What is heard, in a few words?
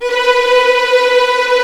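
B4 tremolo, strings; East West; The Ultimate String Collection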